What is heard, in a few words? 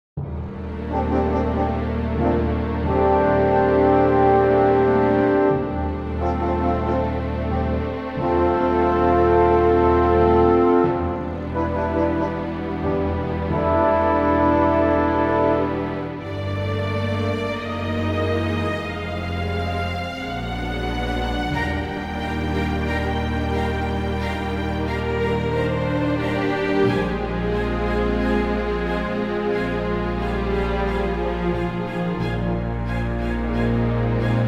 Eb orchestral; key - Eb - vocal range - Bb to C